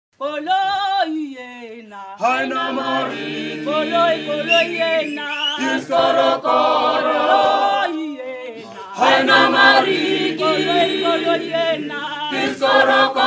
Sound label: choir sa